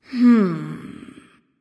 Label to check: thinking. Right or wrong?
Right